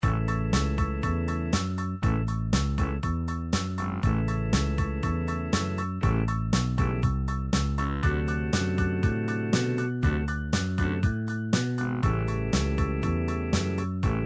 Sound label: rock and roll, midi